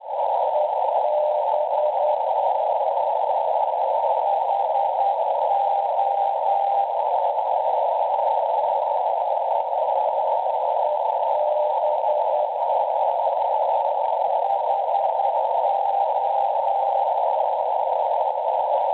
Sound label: NDB 379 kHz; EB; St. Etienne, Francia; Schwarzsee